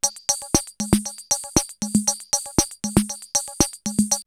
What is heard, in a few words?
CR78 BeatBox, drumloops, DrumMachines